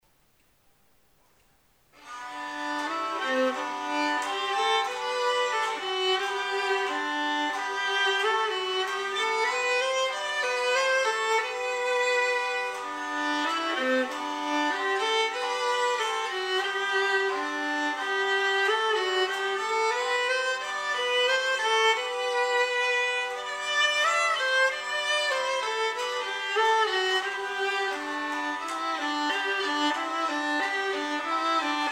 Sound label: musette